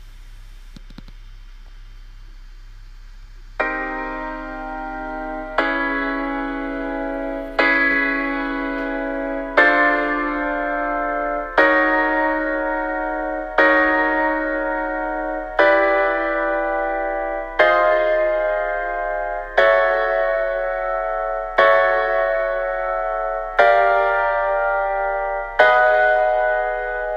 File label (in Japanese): マイナーセブンスコード音源